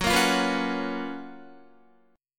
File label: Gb Major 11th Guitar; GbM11 strummed; JGuitar